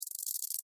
Insect Rattle Shake, Bug; Animal; Insect Movement; BlastWave

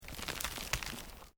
Earth Loose Move Short

